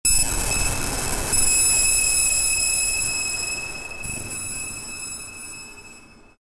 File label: high frequency cut